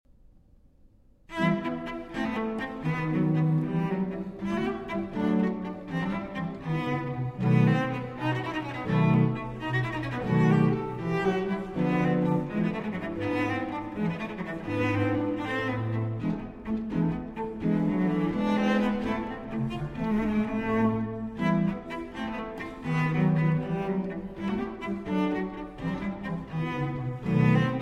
Cello Sonata in E minor, Allegro; RV 40; Vivaldi

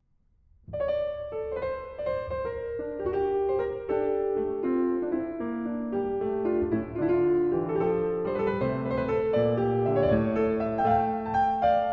Pièces de Clavecin, Suite No. 1 in D Minor, Gigue; Pièces de Clavecin, Book 1

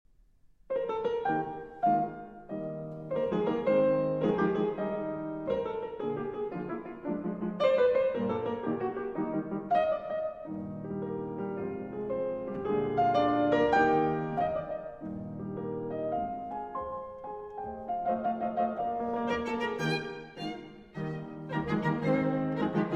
Piano Trio in E-Flat Major, Allegro Moderato

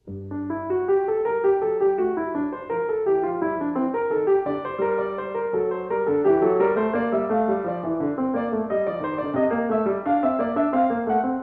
Invention In G; 15 Inventions; J.S. Bach

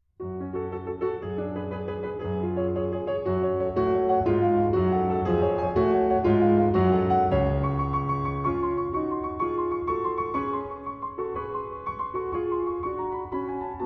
Piano Sonata in F-Sharp Minor, Presto; Piano Sonata No. 9; Op. 5, No. 3

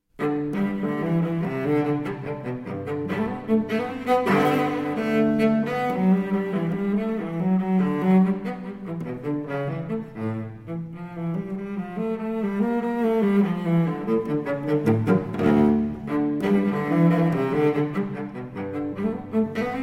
Cello Suite in G Major, Gigue; Cello Suite No. 1; BWV 1007 VI.

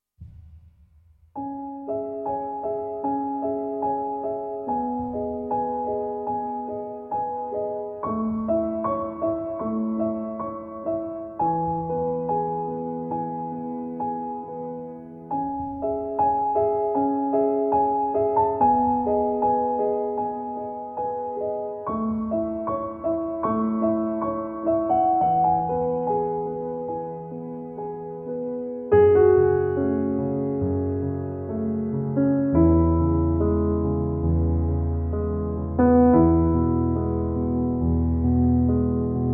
Piano

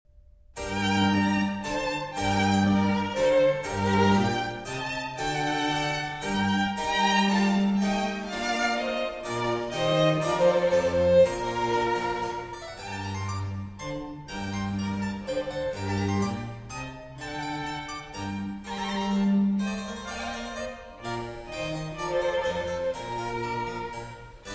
Sonata for 2 violins and continuo in G minor

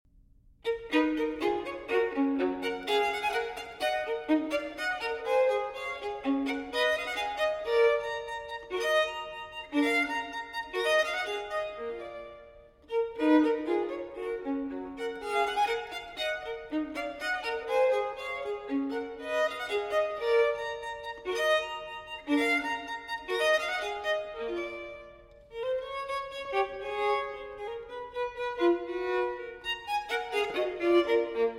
Solo Violin in E Minor Allegro; TWV 4019; Telemann Fantasia

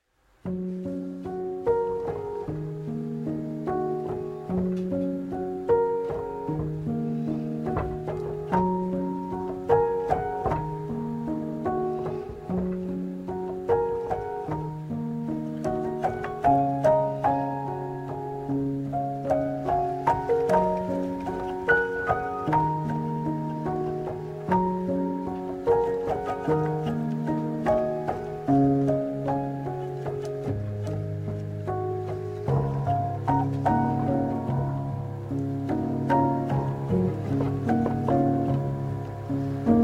Piano Trio

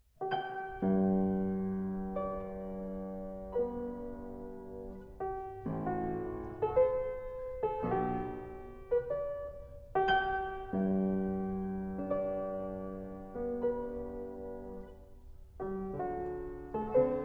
Molto Adagio in G Major; Beethoven; Hess 71 (Fragment)

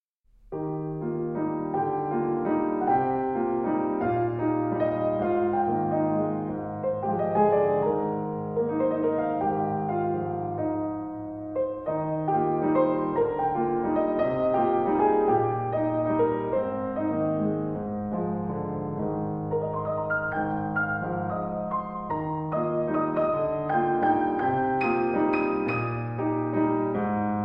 Arr. For Piano

